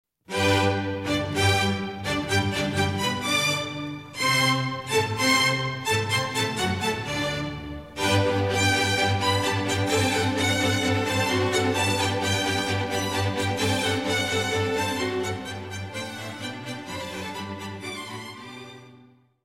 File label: Mozart-Allegro